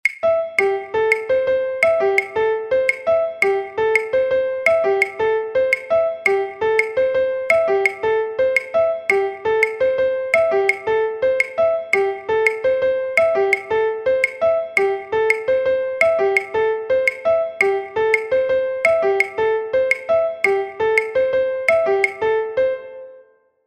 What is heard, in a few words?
piano